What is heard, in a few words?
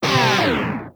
Proj Small Fire; Warframe; Lizzie